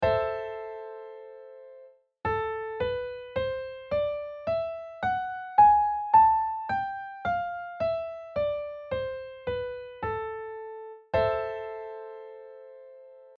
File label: Greensleeves Am Scale Sop